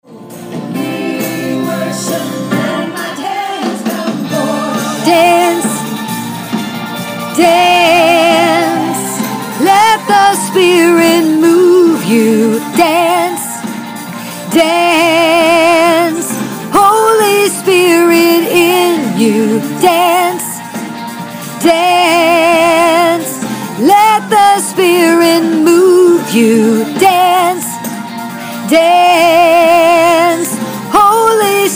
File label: alto